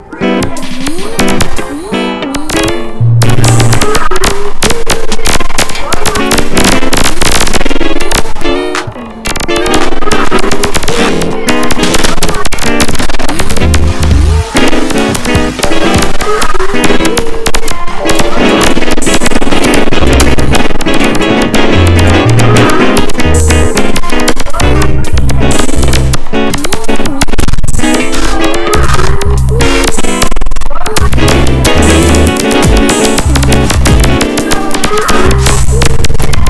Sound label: dubstep